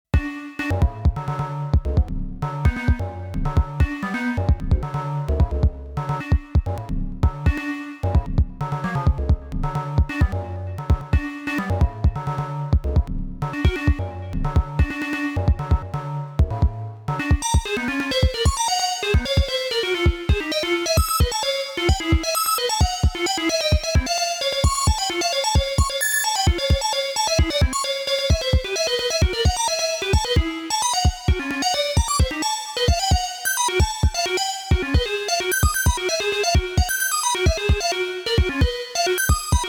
Five12 Melodie Gen Evo

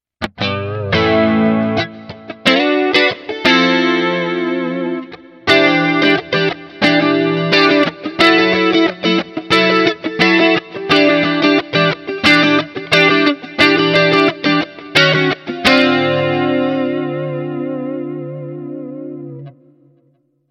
Clean Rhythm Halspickup

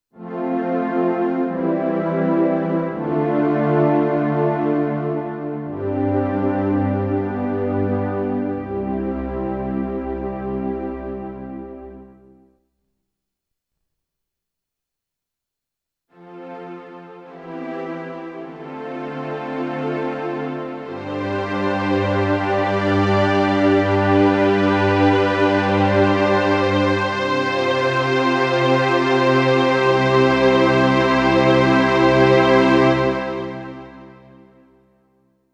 Streicher

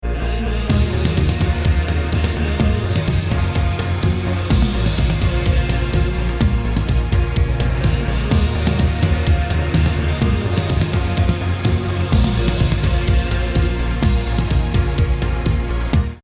ohne EQ

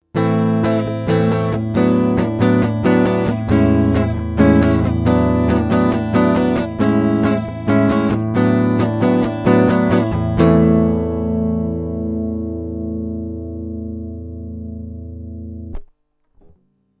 GAP Pre 73 DLX Premier Gitarre INS; Gain 20 HP 0

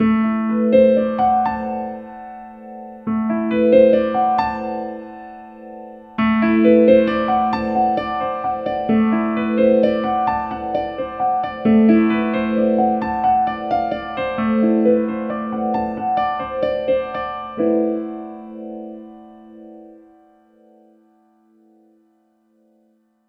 Korg SV 2 Piano mit Hammond AMP Rotary

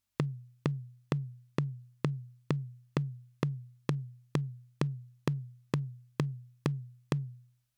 High Tom ohne dann mit AC

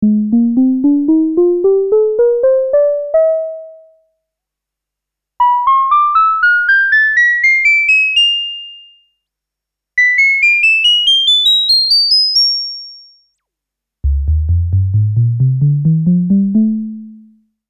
Malekko Manther Filter Keytracking auf max Melodie

